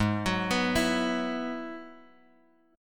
Ab Minor 7th Sharp 5th Ab, down, Bass Standard; arpeggiated; JGuitar

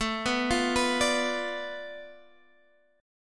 B Diminished, Guitar

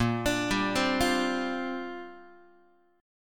Bb 6th Add 9th Bb Guitar; Bb6add9 arpeggiated

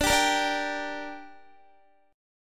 D Suspended 4th Sharp 5th D down Guitar, chord; strummed

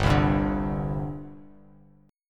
G Suspended 4th, Bass Standard; Gsus4 strummed; JGuitar

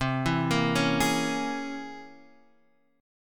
C 7th Sharp 5th Flat 9th C

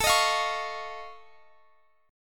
F Augmented 7th A, chord

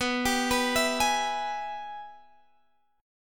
Ab Minor Major 7th Sharp 5th

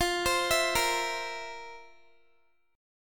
F Major 7th Suspended 4th, Guitar; arpeggiated

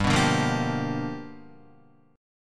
G 7th Sharp 9th G, Guitar; G7#9 strummed

